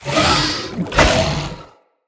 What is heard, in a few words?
Attack Heavy, Vocals; Enemy; Characters; Corrupted Sproutfolk